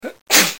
Eternuement

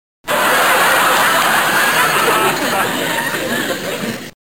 Rire

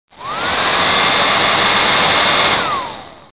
Seche cheveux

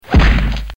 Poing

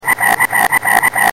Grenouille

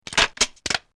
Recharge

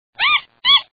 Singe

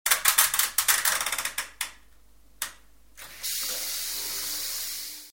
Lave linge

